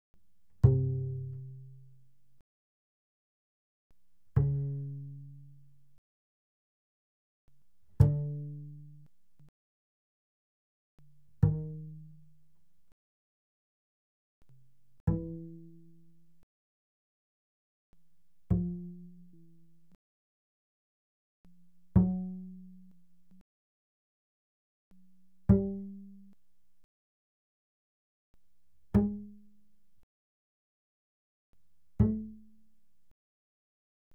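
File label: Bass pizzicato fortissimo sul A C3 A3, doublebass; Strings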